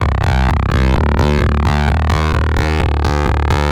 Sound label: Dirty Bitch C, acidized synth loops, 129bpm; Zero-G; Soundclash; Nu Dance Electro